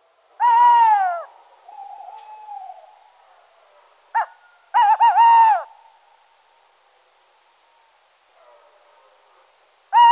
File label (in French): Chouette hulotte